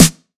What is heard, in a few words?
909 Snr Sweet, Tape 909, SD; Goldbaby Tape808 & Tape909; The Tape 909